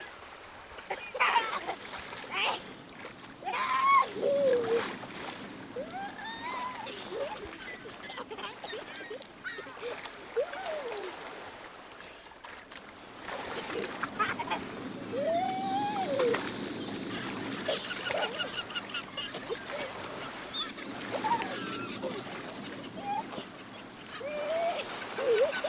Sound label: Relaxing Ocean; Children